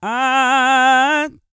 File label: SING, Vocal Planet R&B & Dance; ILIO